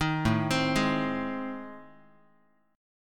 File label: A Minor Major 7th Flat 5th, Guitar; arpeggiated; AmM7b5; JGuitar